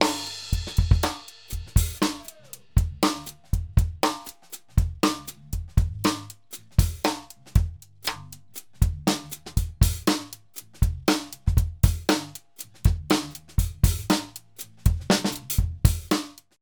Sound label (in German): Subgruppe Modern Kick